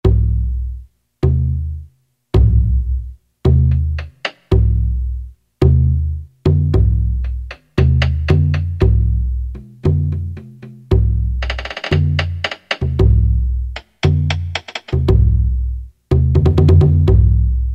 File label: Wadaiko Set, Roland D-110; Factory Patches